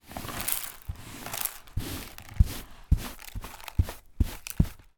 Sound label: sweeping debris from carpet dustpan brush, household